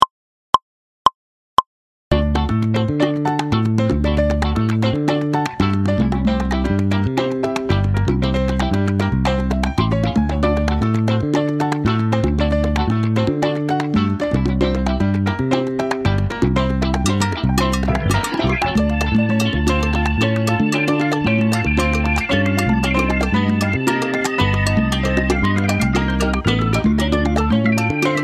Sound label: Cascara mambo songo Latin music, Drumkit